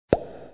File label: shoot; ICTS Denmark